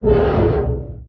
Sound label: damage vehicle; World Of Warships